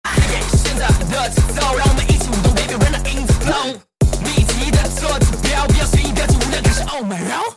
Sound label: Rap